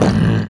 Human Perc, Body Perc

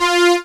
SYN Melodik; Best Service Gigapack 1

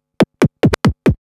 Scratch Fills 140BPM

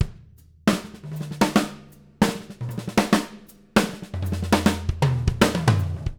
154 PUSH, NTPASS, Living Drums; Sampleheads; Peter Erskine

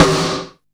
Snare, Drum & Bass; Claps; AMG - Prototype Drum & Bass; Snares

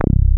BASS, SYN BS

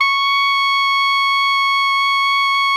ALTO MF C#5, Saxophones; Greytsounds; Philip Wolfe David Crozier's Saxophones

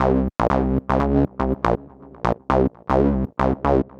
Techno Synth

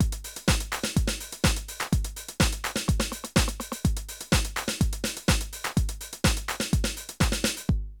drum loops, House & Garage

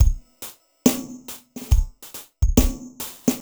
Glass Loop, Drums & Percussion, Electronic; Sonic Foundry (Sony Creative Software) - Electronic Point Blank

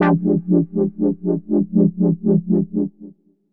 stab wobba, stabs

